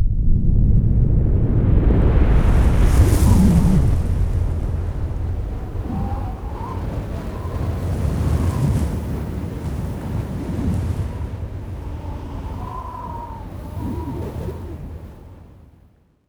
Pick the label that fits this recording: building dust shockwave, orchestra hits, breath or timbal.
building dust shockwave